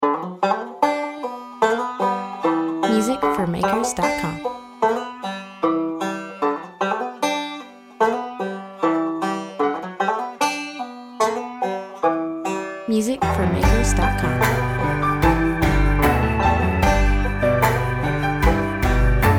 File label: victory march; overdub